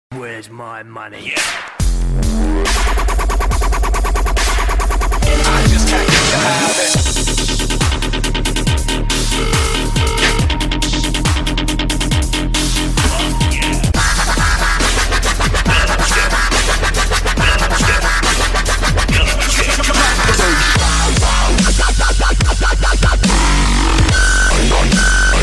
new mix tone ringtone

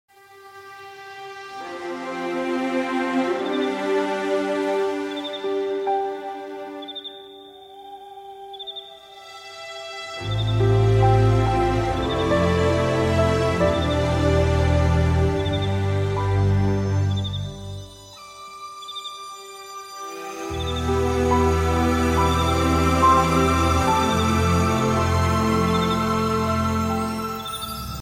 music ringtone

